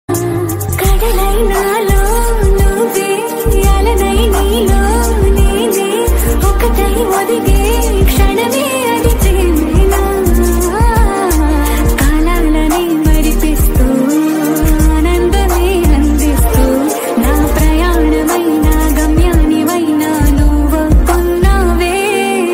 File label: love songs telugu